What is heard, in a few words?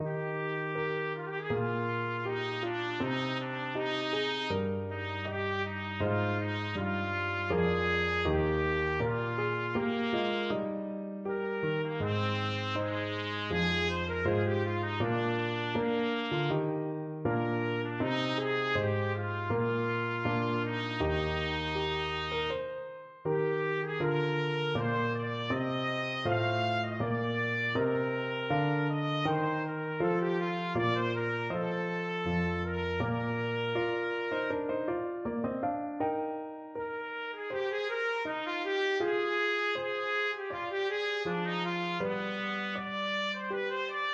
trumpet